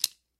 mouseover; tennix

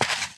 sand footstep; minetest; pedology

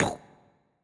Pffough Verb; BattleCats

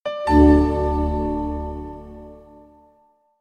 User is Online; kdenetwork; Kopete

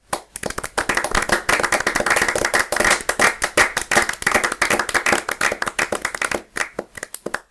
win; snowy-minesweeper

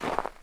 ice pure footstep; minetest; pedology

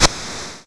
bomb place; gdash

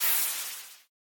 piston; voxelands

